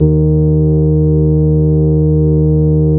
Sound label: SLAP D#1, Bass